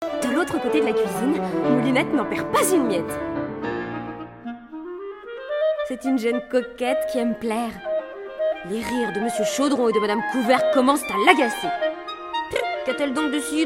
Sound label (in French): Clarinette; la revue de cuisine